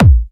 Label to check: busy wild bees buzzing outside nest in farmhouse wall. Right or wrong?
Wrong